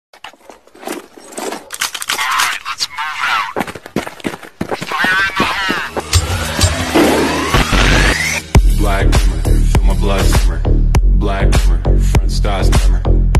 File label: tamiz shodane otaq